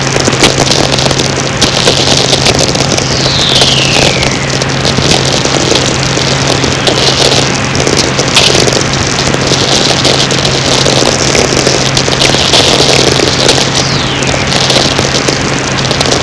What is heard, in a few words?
three whistlers, VLF; Kiel; St Michel